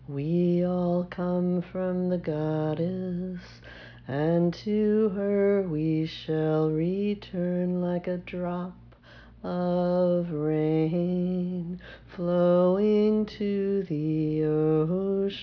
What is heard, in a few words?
Sung, chants